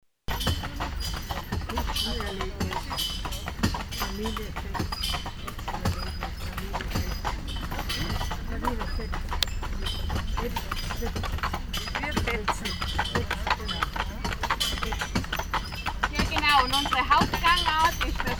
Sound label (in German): Pferde im Schritt